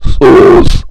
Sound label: sus sound; SussyCraft; amogus general